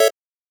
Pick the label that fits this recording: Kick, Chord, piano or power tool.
Chord